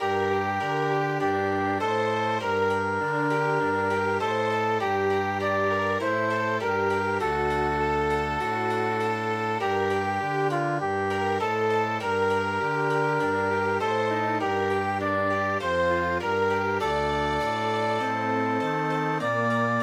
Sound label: Fortune my foe Chamber Music; traditional